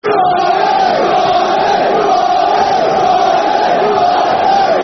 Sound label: Blue Army Blue Army [Football Chants (Soccer Songs); fanchants; Ipswich Town Itfc